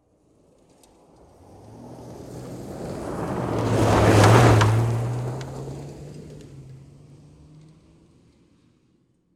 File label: Auto SUV Pass Gravel